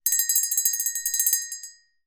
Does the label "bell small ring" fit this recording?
Yes